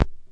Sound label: drop needle; Scratching and Turntablism; DRUM LOOPS and BREAKS